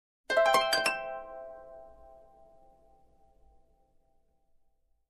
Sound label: High Major Harp Chord